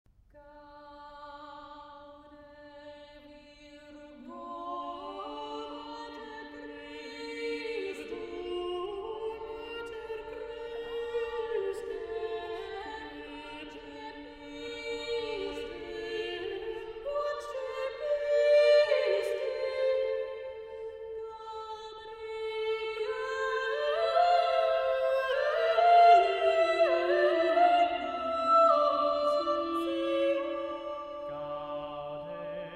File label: Motet